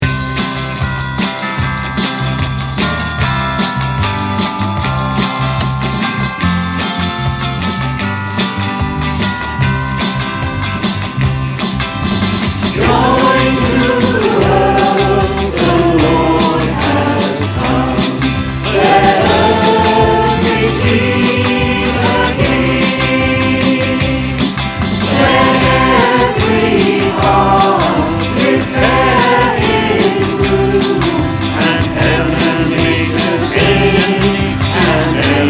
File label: Joy to the world, choir; virtual choir